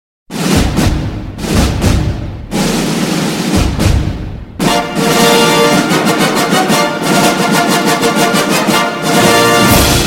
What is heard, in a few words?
jingle, ringtone